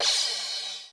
Crash, Korg DSS-1 Drums

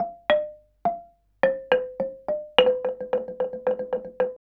KULINTANG; Spectrasonics; Heart of Asia; KULNGTANG PH

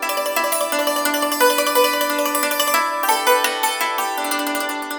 YANG CHING, Heart of Asia; Spectrasonics

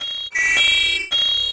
MET SW SIN, SWORDSING; Total Control - Action Cutz

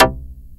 TURBO ACID

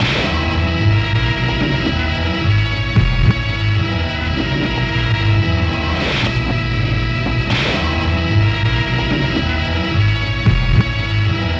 Fight E; Zero-G - Ghost In The Machine

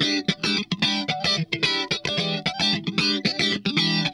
SQUASH, Funk Guitar; 90 s Sample CDs; Zero G

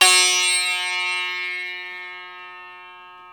SITAR, Plucks; Eth Plucks, Pluck Duo

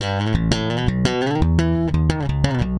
Electric Bass; Sonic Foundry (Sony Creative Software); Hydroponic Hip-Hop

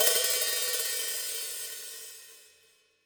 Real Drum Kit, JUNGLE KIT

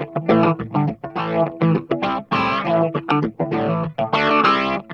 ORGANISM, Funk Guitar; 90 s Sample CDs; Zero G

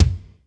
ROOM K, ROOM KITS; Crypton - DrumBase5000

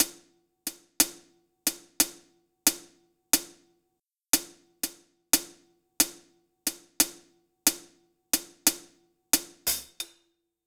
Hi Hat

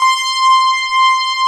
MGP LEAD; Pro-Rec - Synthsonic; JV ANALOG1

